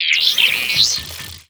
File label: Microbot Attack